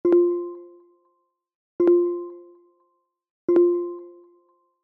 Seatbelt

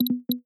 Tread Stop; Peloton

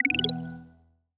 Incline Spin; Peloton

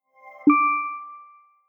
Whisper Connected

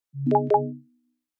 Door Ajar

